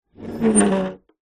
metal lever or hinge rusty old movement squeak creak; zapsplat; foley